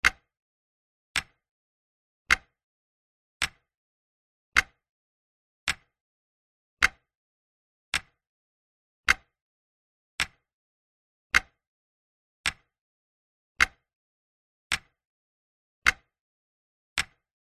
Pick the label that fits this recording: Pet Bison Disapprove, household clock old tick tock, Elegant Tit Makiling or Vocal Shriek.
household clock old tick tock